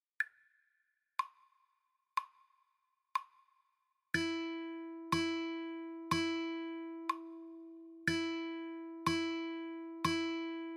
Jingle Bells slow, guitar

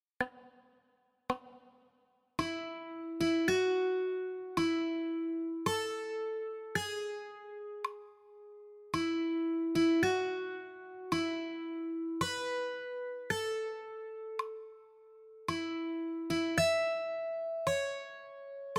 happy birthday, guitar

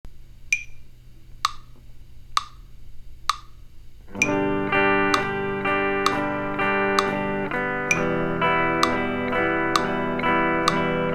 Chord switching part II 8th notes, guitar

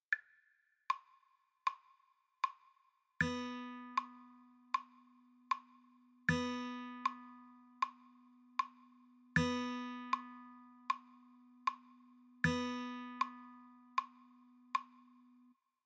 whole, guitar

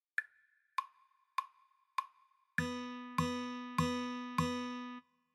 rhythm intro, guitar

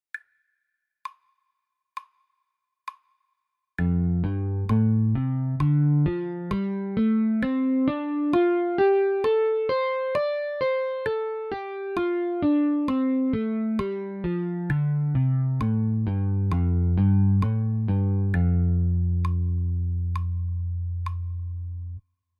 3 octave e d

